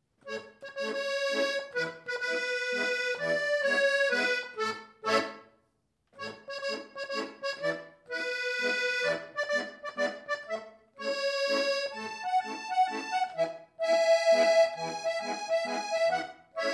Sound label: Mazurka Figurata